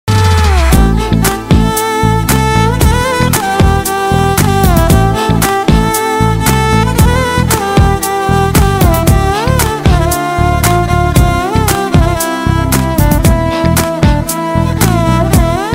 instrumental ringtone south bgm ringtone tamil bgm ringtone telugu bgm ringtone